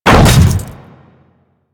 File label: Hammer Boss Land Default, Footsteps; Warframe; Grineer